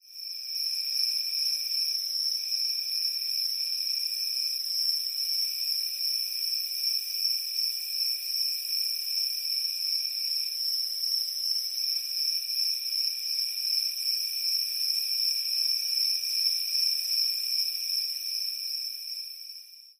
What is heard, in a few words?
summer night insects, nature